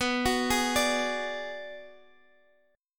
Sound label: B-Minor 6th B down Guitar DADGAD